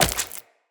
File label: Tenno Wall Jump Flesh, footsteps, wall run; Characters